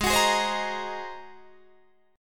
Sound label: G sharp 9th, Guitar, Open D